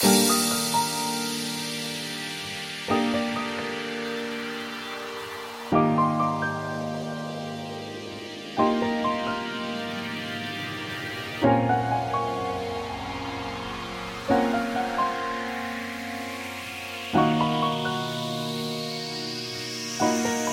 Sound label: Chillhop